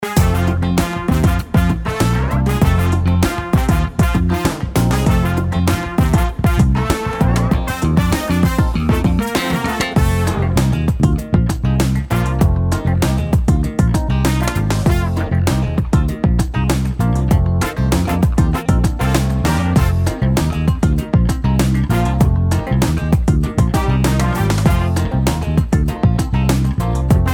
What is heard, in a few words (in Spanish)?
La Cancion De La Amistad Instrumental